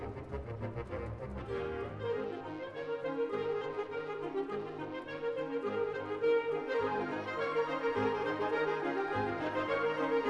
classical

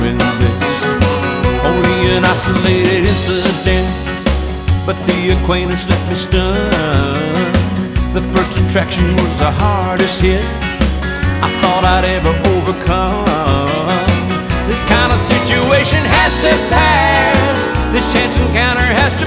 country

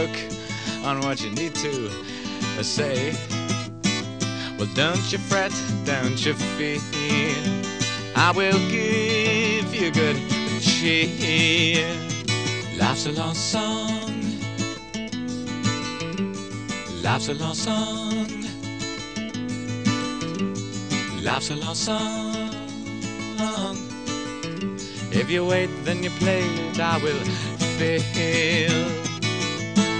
rock

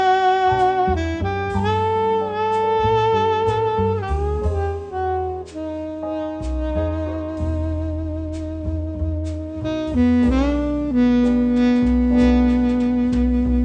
jazz

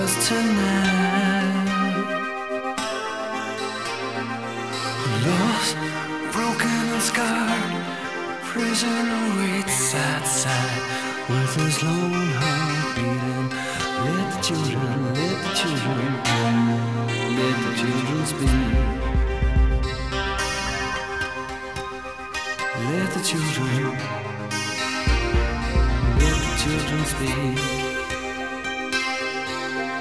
rock

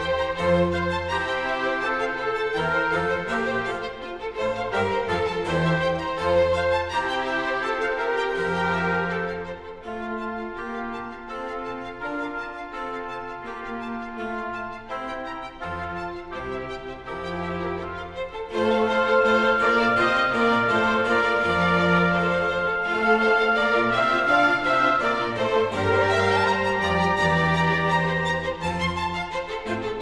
classical

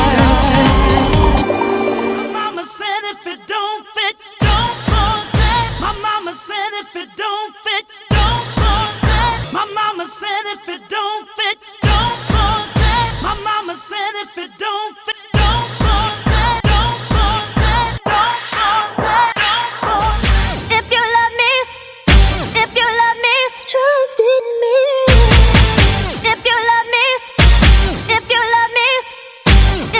pop

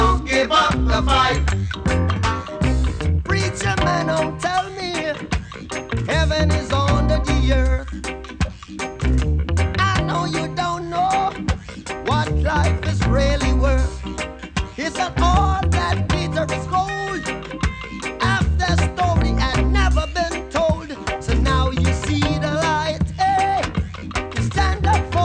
reggae

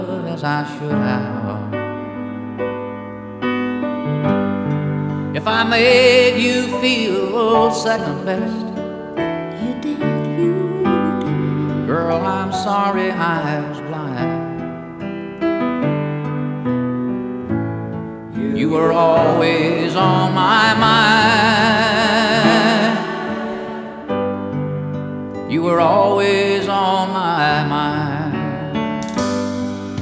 country

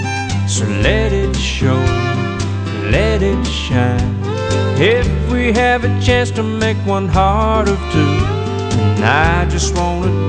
country

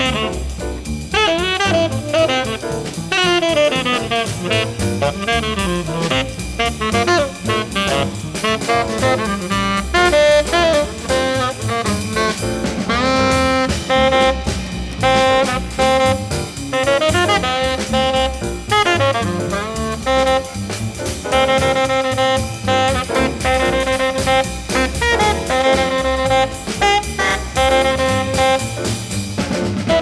jazz

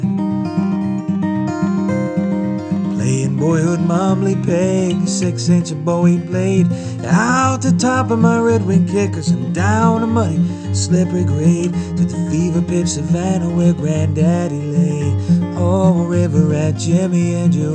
blues

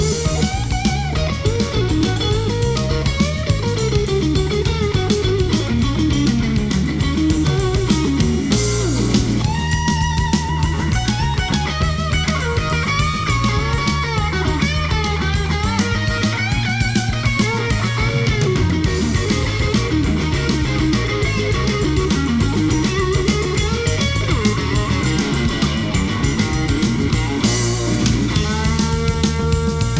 blues

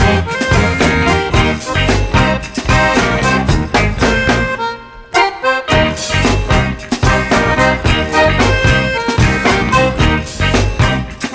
blues

